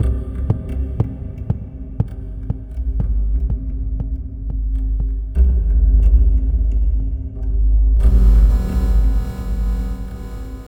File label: Tabla, 90 BPM; Zero-G; Pure Tabla